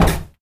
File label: Wood Step